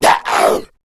zombie vox male pain; tf; slender; rave in the redwoods

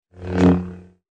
hit; freak fortress 2; grievous

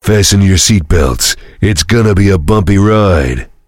heavy singing; tf; duke

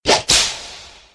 scream kill

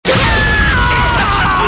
death; hl2dm

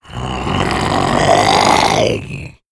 hunter stalk, zombie vo; Left 4 Fortress

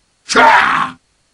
hit cha; superheros; ironman

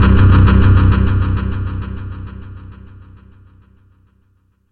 guitar chord; tf; axel nosferatu

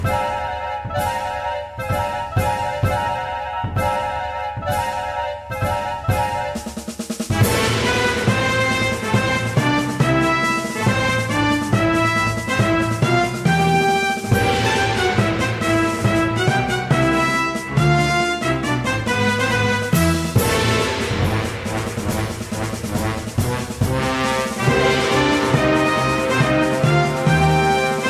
music; porky

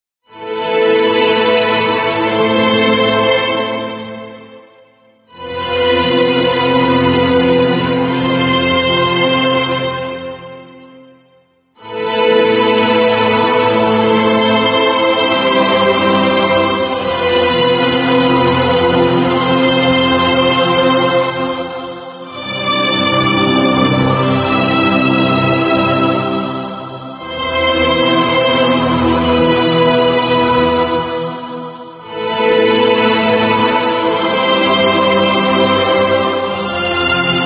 strings of pureness; stinger; larsa

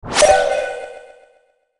timetravel pickup

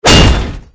hit; freak fortress 2; lich king

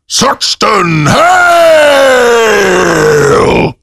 rage; saxton hale

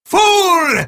death; companion

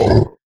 kranagull scream; kraak

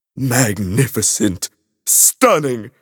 grooves positive; tf; a hat in time

